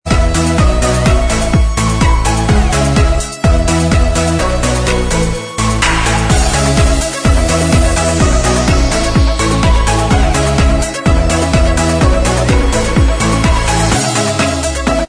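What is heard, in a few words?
Instrumental Track, backing tracks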